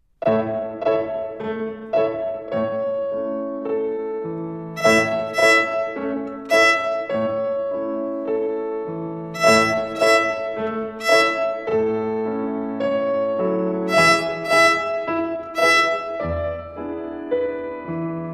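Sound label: Rhythm on E Second Twinkle Rhythm, violin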